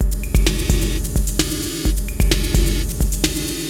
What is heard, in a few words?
Beat (130BPM), Gated Reverb; musicradar